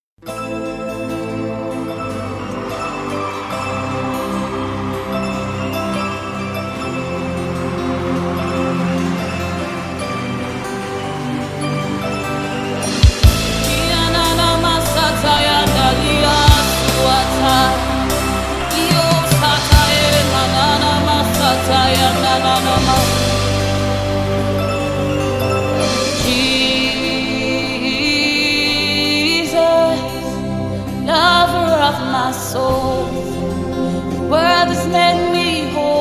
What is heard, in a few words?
Strings